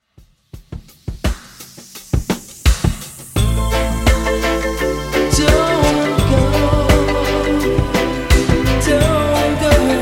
Dj Intro Outro 85Bpm

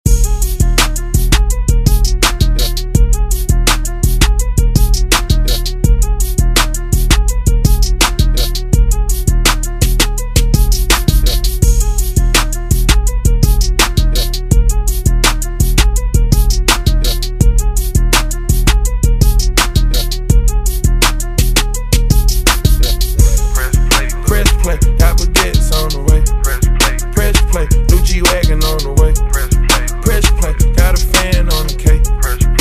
Hiphop Redrum

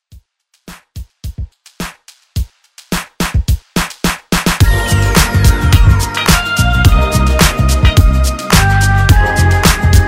Pop; Redrum

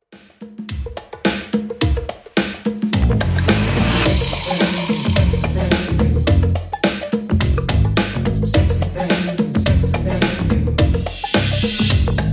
80s Replay Redrum 107bpm

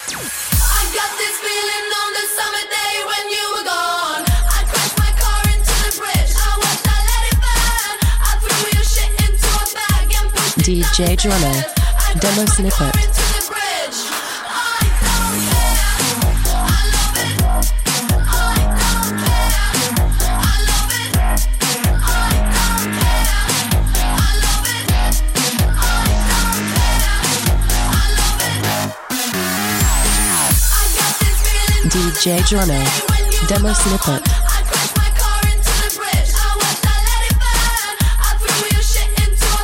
Fm 128 BPM

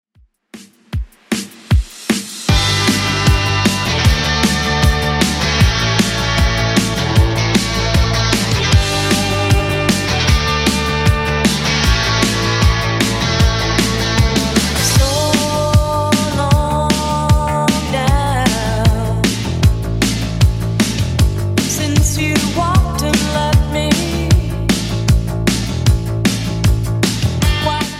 80s Redrum 156bpm

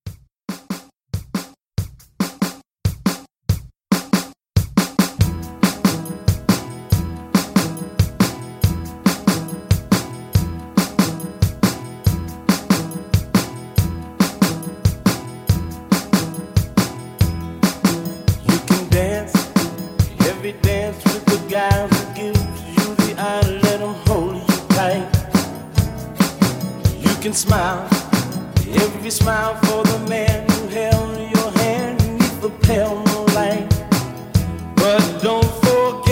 60s Pop Re Drum 140

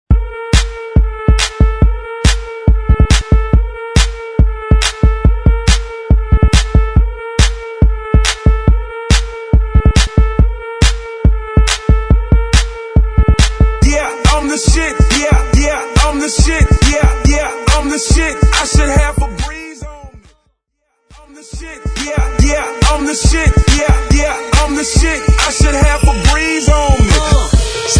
Remix Hype intro

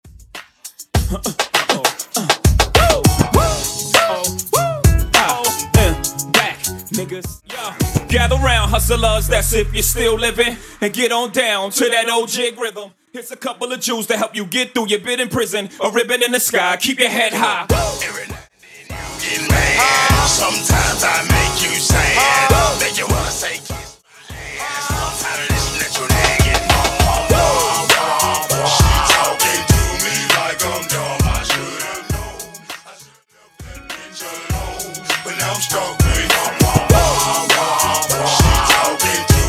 2000s Acap Breakz Rap 100 BPM; Quick In Outro

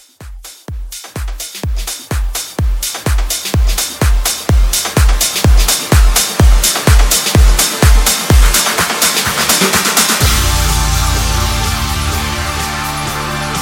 70s Rock House Bootleg 126

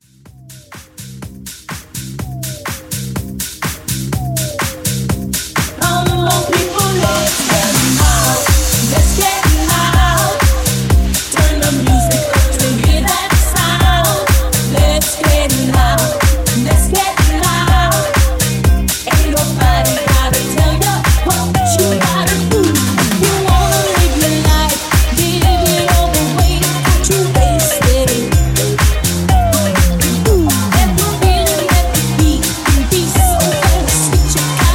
House Mashup

